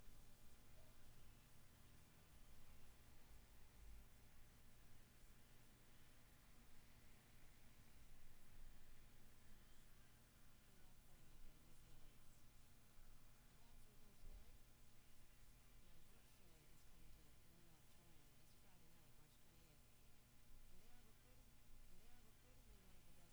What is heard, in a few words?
roomtone